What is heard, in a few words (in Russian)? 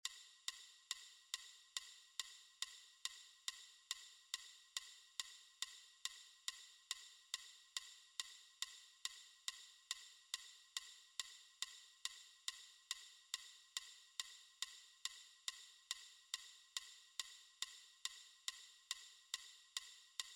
метроном 140